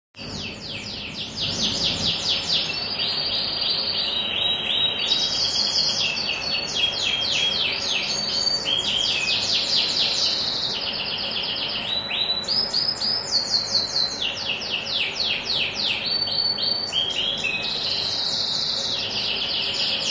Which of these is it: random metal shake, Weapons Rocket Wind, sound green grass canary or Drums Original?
sound green grass canary